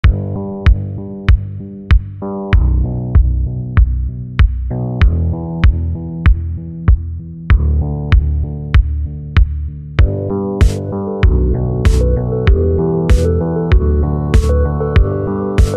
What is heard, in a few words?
instrumental slowed reverb and bass boosted